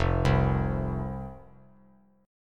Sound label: F 5th F, down, chord; F5 arpeggiated